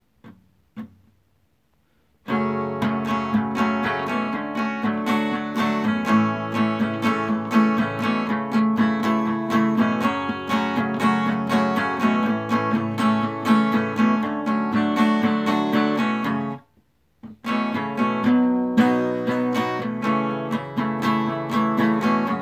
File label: acoustic; havinaball; houstonastros